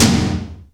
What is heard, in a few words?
doom tom, Roland R-8